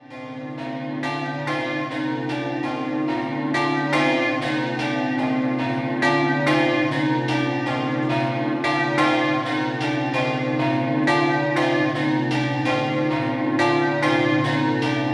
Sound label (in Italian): POZZUOLO DEL FRIULI, campane